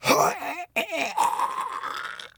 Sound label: agony; Escape from Tarkov; scav4